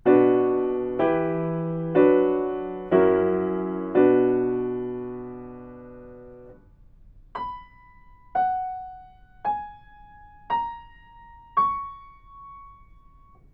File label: melodic fragments